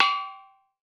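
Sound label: PANARUS, Metalophones, Pitched Percussion; E-MU Producer Series Vol. 7 – Old World Instruments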